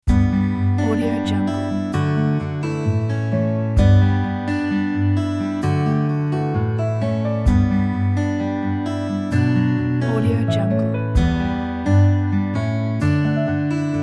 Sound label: easy elegant folk